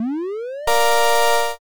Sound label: summon